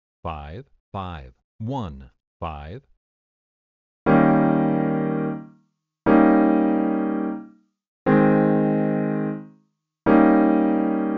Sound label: I, V7, progressions